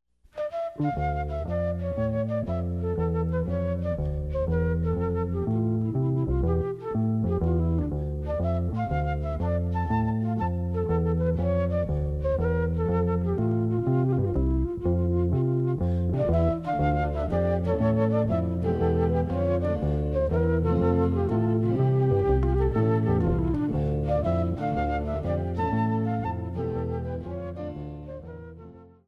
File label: bouree